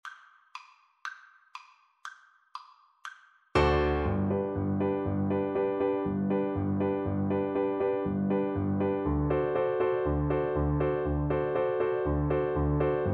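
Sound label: viola karaoke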